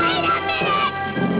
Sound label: screams